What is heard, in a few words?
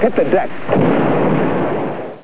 hit deck; hawaii